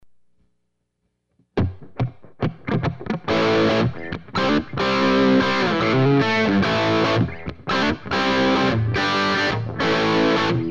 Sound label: crunch